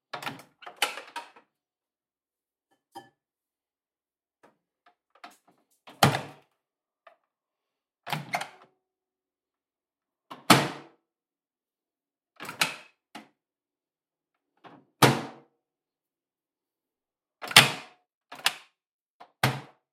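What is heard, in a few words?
opening and closing microwave oven door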